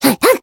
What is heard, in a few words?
Voice attack